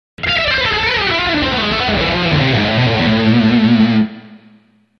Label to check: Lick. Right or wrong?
Right